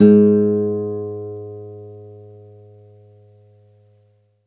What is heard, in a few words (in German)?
KONZERTGIZ, Alpine Volksmusik